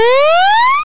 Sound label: Video Game Jump